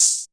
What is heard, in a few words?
Roland T6 Hats; drum machines